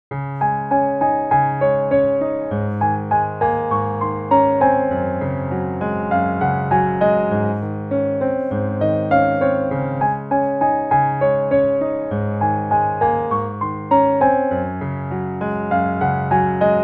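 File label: Stein, Steinway piano; Piano Plugin; galaxyII pianos; vienna grand imperial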